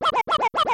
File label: baby bowser talks; smw2